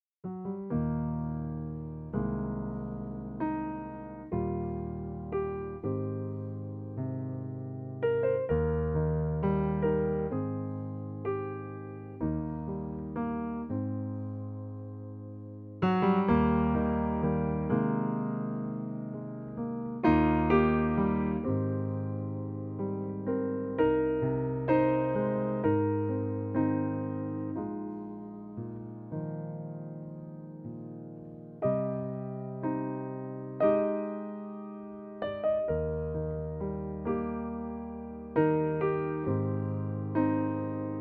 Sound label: Pianoteq Chords; Bluthstein